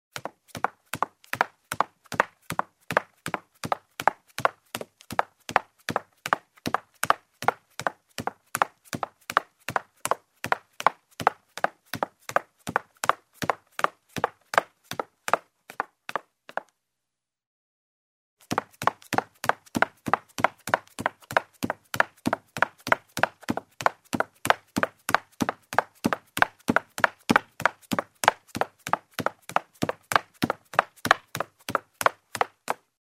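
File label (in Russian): каблуками по деревянному полу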